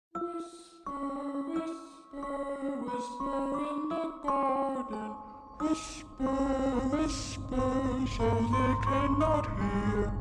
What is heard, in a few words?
Whisper